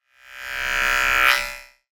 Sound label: Whoosh Shaver Pass By; Just Whoosh 3; soundbits